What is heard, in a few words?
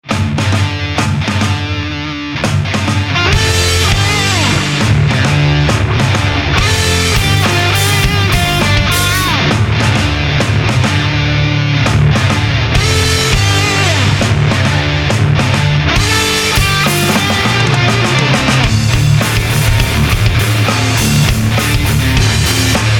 drums no vocals